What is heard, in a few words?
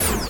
Switch; Zenonzard